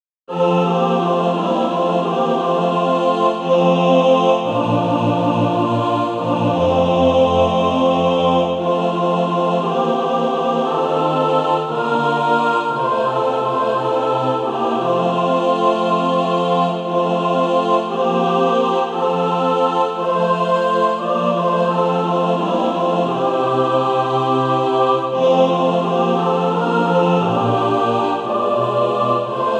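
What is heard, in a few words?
mixed, Practise Music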